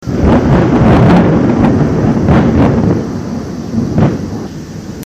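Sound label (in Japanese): raimei, thunder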